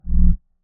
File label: Low Pitch